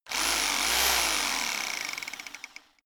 rock drill chisel burst rev up; schrauben drehen hydraulik

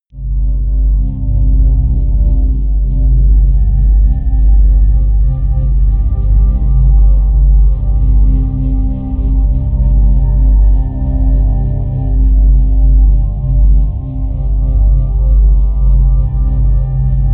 drone solitary

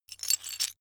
metal pieces clatter short

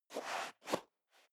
step high heels sand scuff; schritte

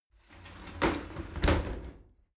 shower slide door close regular

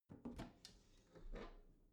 bathroom cabinet open soft squeak; objekte; tueren

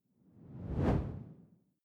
whoosh simple low; swooshes-swishes

